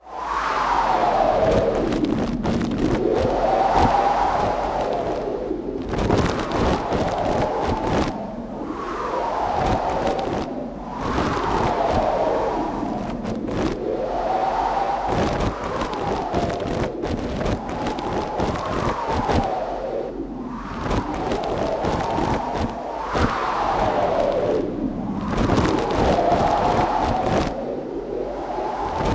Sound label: cloth; Seer; GamigoNA